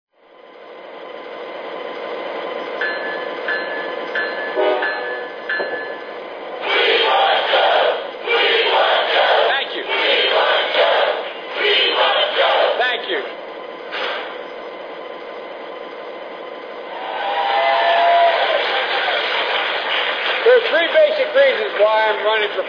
es44, trains

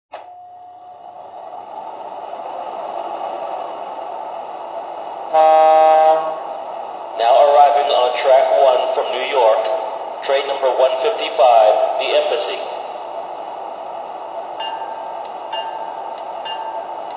gg1, trains

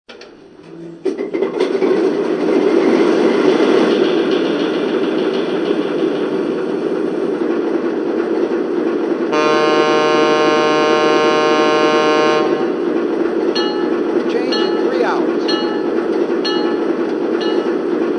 ALCO RS3, trains

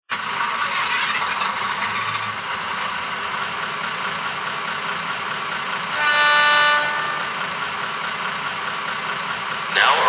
Budd, trains

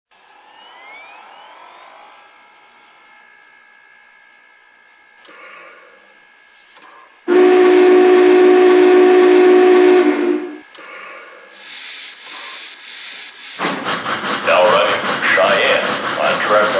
Big Boy

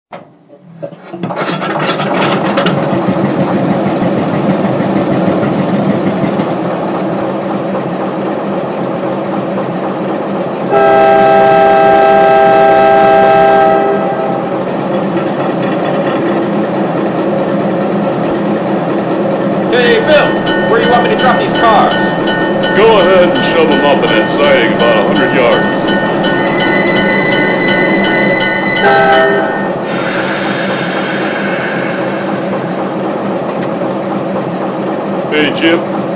GE 44 Ton, trains

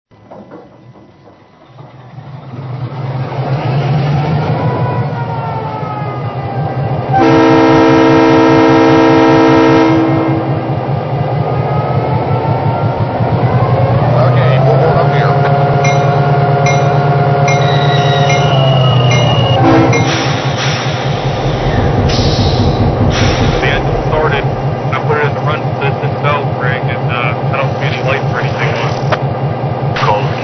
EMD F3

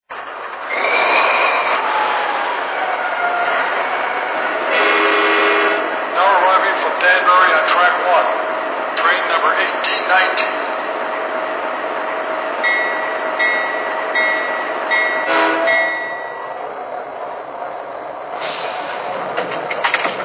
GENESIS, trains

